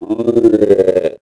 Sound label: ithorian die; creatures; Star Wars Obi-Wan; XBOX